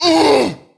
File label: half ogre female hit; DAoC